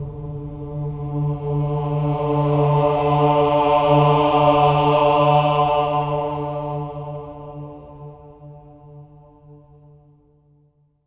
AH D3, swell ahs, Symphony of Voices; Spectrasonics

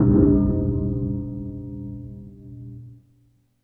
Klang bass; Sonic Foundry (Sony Creative Software); Ugly Remnants VOL-2; Basstuff

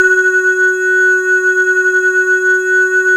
SYN KLACK, Microwave; Sound & Vision - Gigapack I CD 2

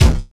BD, Drum & Bass, 160 BPM

